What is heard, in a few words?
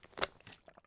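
tetrapak with cap open; Escape from Tarkov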